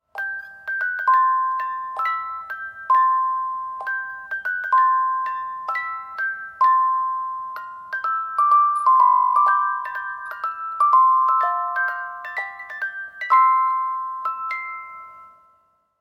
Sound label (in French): casse noisette